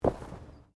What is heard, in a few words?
Body movement Clothing Foley